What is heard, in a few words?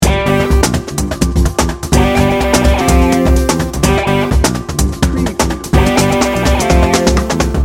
hectic hip hop loop